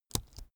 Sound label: keyboard laptop space press slow soft